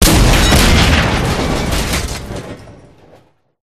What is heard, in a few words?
gunstand destroy, turret; gow; turret judgement